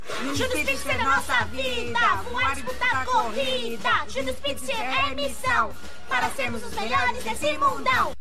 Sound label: Junior Speedsters Chant, Brazilian Portuguese; Season 1